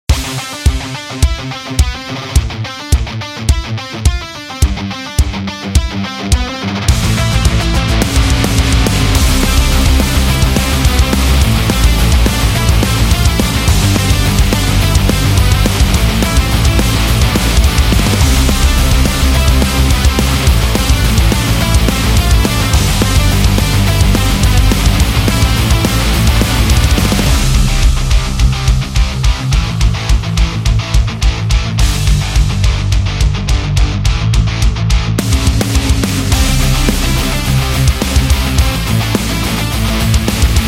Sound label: Punk